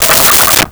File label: Wood 2x4; Tools; 1001 Sound Effects